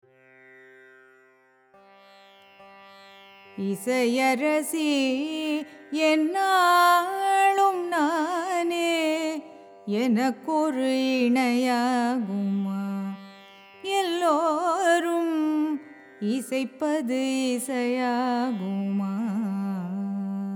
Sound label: raga surya cine